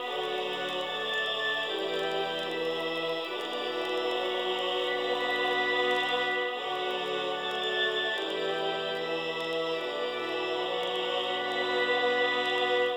melody musicbox gramaphone

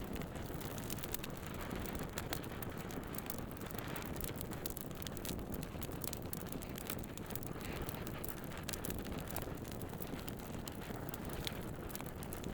coal boiler torch loop

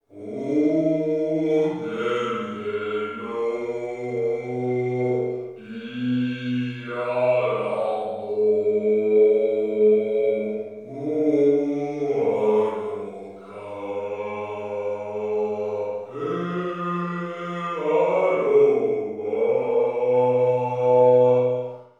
Old Statue Sing

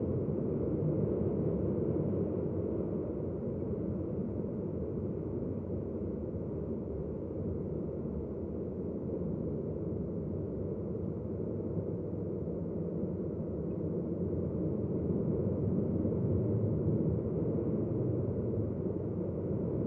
cave atmos; Hollow Knight: Silksong

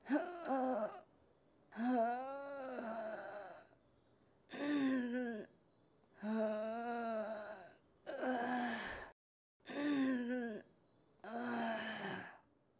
weak loop

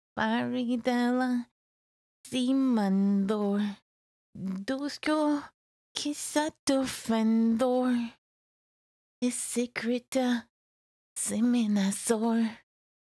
Sing Scared

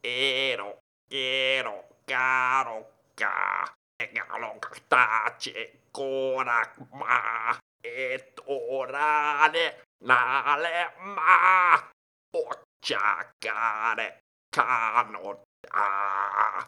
sing

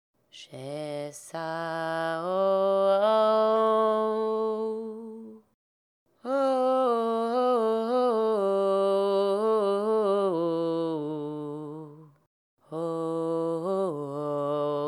npc female mid sing

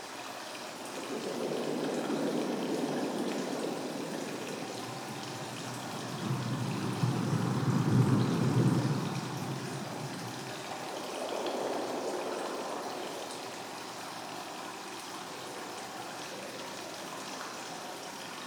atmos hanging garden

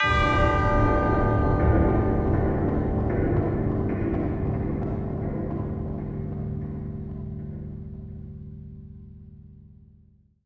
door bell

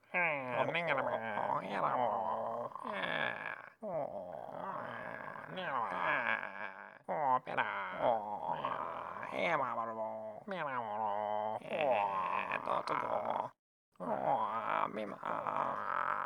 Courier sleep duo; Hollow Knight: Silksong

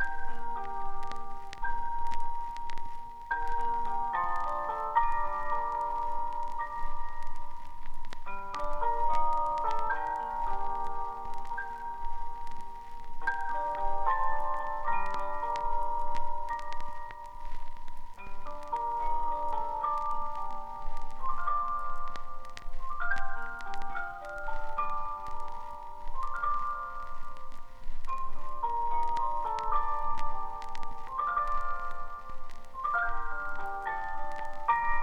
cylinder music box; citadel halls

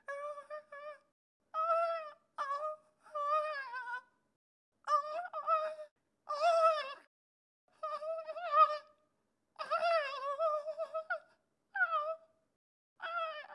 scared loop

